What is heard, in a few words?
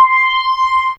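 M D Weeeeee C2, Fairlight CMI IIe Analog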